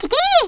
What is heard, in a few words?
Kikii (Bats); Riviera